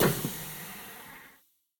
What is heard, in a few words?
Panel Open-Rest; Intro Vf-Pod